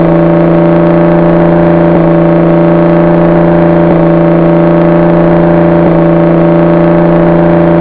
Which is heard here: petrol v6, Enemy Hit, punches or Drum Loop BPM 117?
petrol v6